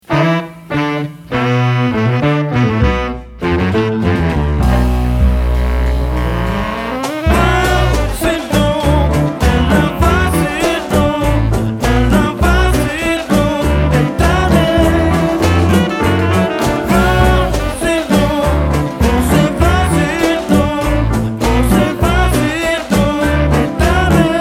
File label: batucada jazz